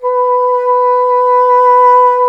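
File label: wind bassoon B; Miroslav Vitous; Solo Instruments VOL-1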